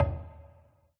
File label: btn spin stop; hades River Of Souls; Fantasma